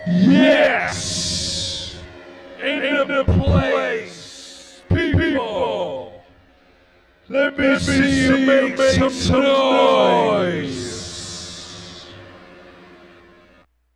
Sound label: Robot Live 152 Abmaj, Hardcore Breakbeat; Big Fish Audio; Hardcore Breakbeat Action